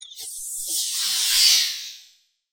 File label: Fizzling Flashback